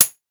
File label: Shaker; WoWInterface; Plater